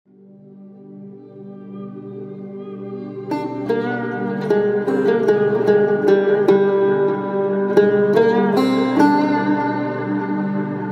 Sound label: The Vibrating of Its Strings